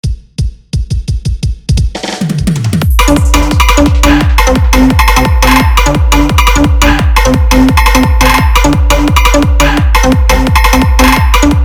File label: siren horn music, nonstop dj competition song